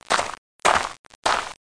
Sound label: Move Foot; Stratego